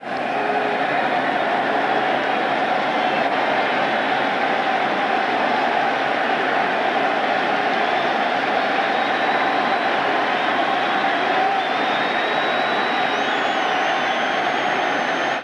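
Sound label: Baseball Crowd Ambience Excited; Crowds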